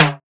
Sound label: Snare Timbal Low; Classic626